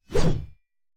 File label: Heavy Blade Swing; Scrap Weapons; Zomboid